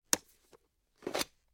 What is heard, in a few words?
Knife Pulling Out Leather